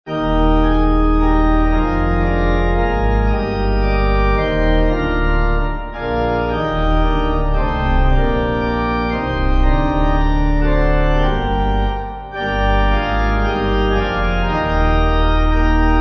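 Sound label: Pipe, pipe organ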